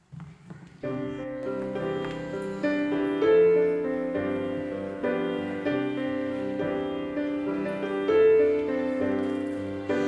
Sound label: Children's Choir